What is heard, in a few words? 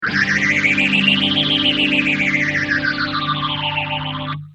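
guitar Mutron biphase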